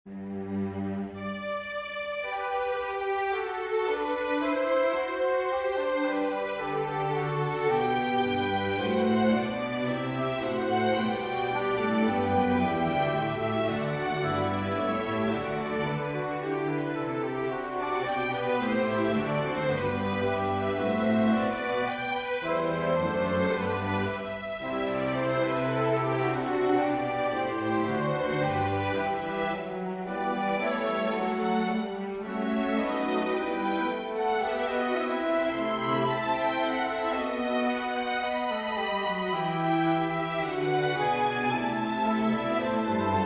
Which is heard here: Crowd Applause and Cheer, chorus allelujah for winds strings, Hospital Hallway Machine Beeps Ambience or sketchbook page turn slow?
chorus allelujah for winds strings